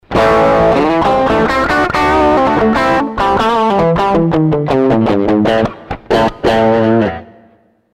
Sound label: strat direct, guitar